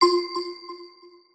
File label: Sfx American football